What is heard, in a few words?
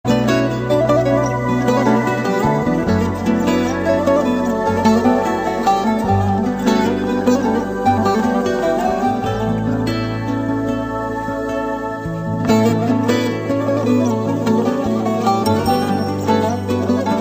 latest turkish music ringtone